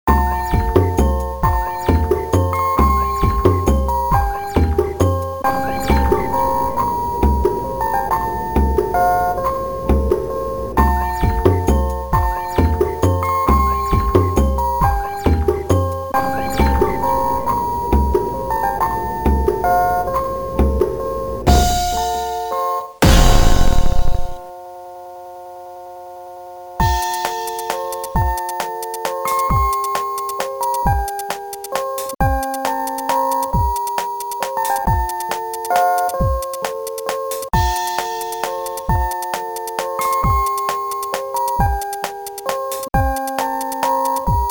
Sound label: lullaby